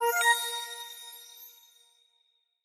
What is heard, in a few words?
winter chip minor